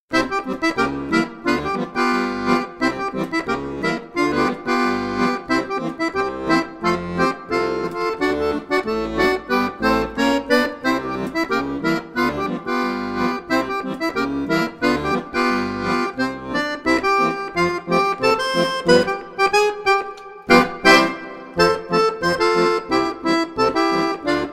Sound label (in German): Katzen Ragtime